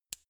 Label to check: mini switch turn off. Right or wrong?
Right